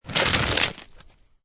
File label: Snow And Ice Hard Snow Or Ice Grate; Weather; Lynne Music